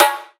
trebly trap snare drum g sharp key

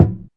short kick single shot a key; kick drum samples

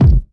clean bass drum sound f key; kick drum samples

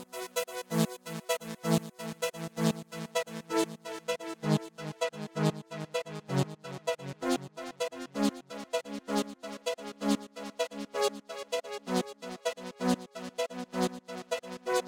129 arp synth leaf techno house; instruments; loops

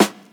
90s dope hip hop acoustic snare sound g sharp key; hip hop snare drums

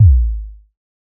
verby bass drum one shot A key; kick drum samples

